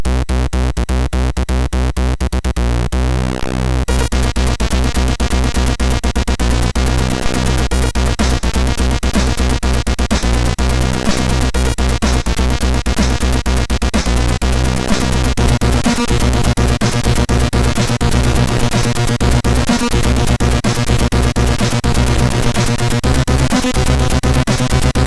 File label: Lame tekkno, MOS6581R3; Cyke